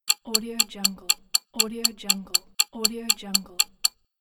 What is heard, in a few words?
ticking clock